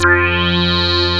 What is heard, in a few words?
AN SYN, SYN & LEAD; SAMPLE WAVE MIXING 2